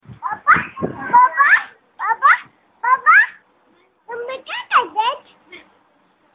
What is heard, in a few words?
cute tone; children